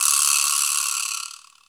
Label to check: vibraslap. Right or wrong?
Right